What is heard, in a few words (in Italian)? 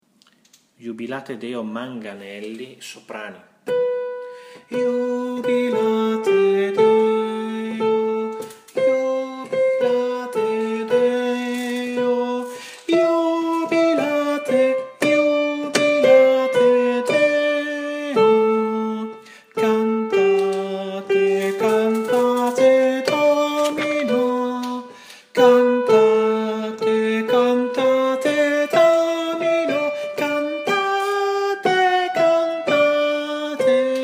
Soprani; Iubilate